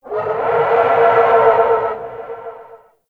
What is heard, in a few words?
Distant Creature Shriek; Ambience; Warframe; The New War; Narmer Outpost